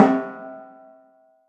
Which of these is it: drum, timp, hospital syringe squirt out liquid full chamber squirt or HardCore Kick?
timp